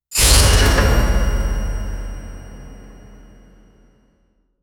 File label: sound effect, cybernetic